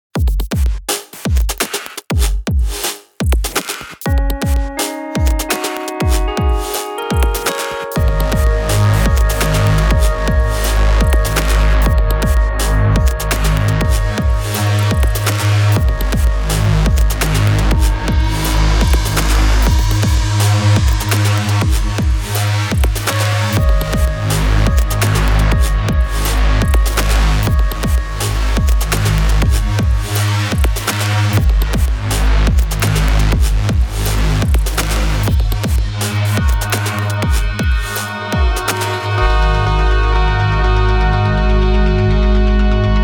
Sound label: Lofi Energy; Dust Materials